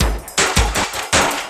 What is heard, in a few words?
Drum & Bass, 160 BPM